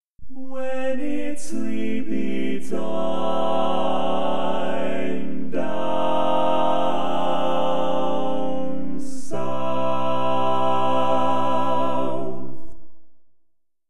Tenor, barbershop tags

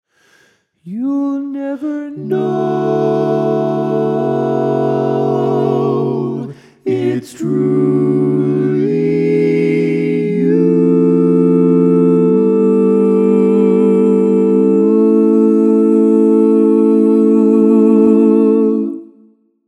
All Parts, barbershop

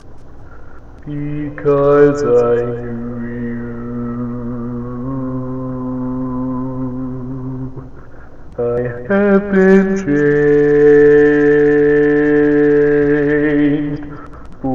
Bass, barbershop tag

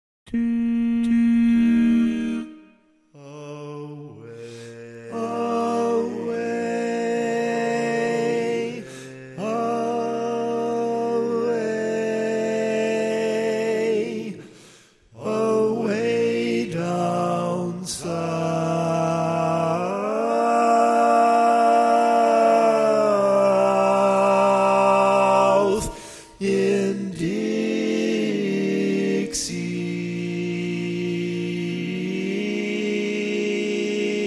Bari, Barbershop

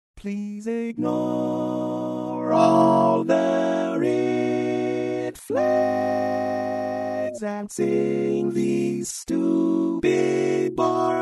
Tenor

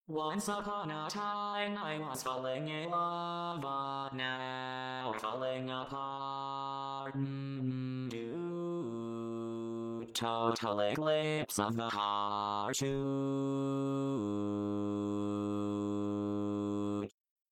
barbershop tags

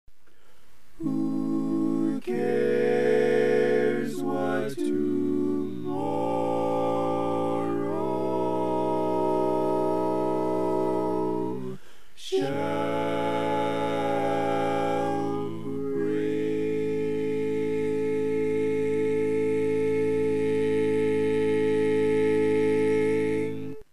Bass, Barbershop

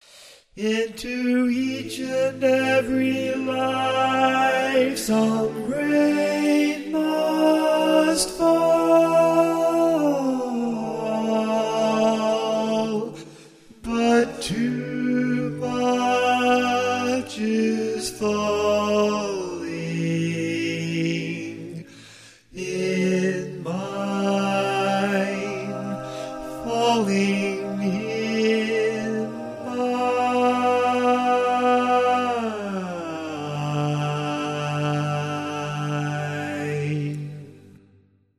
Lead

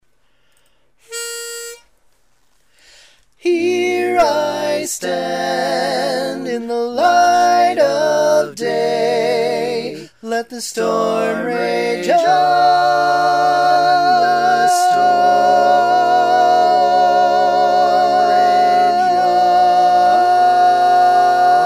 Lead, barbershop

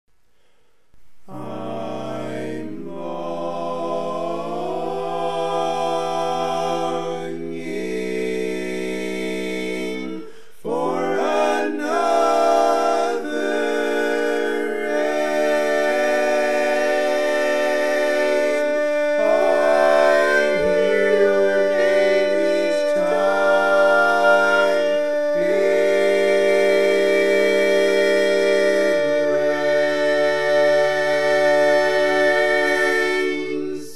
Bass, barbershop

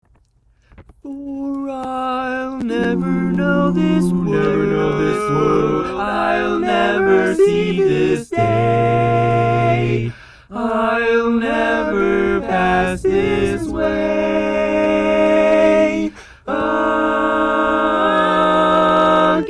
Bass, barbershop tags

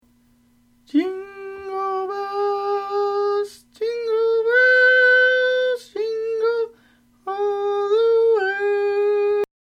Other, barbershop tags

